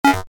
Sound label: Beep Buzz